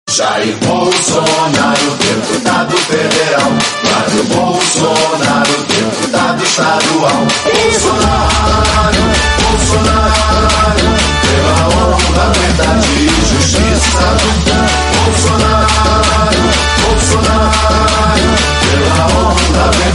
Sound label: funk